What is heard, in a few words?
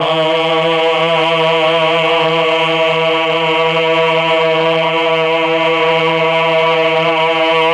CHOR; ILIO; Propeller Island Legendary M400